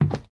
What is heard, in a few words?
Wood soft